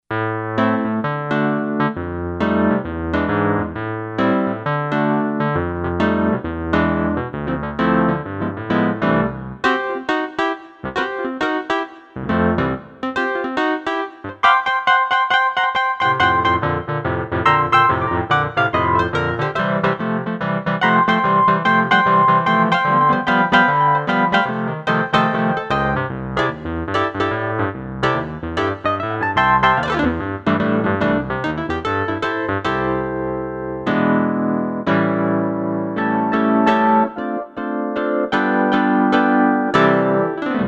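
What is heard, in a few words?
tremolo WURLY, Roland SC-880; Factory Patches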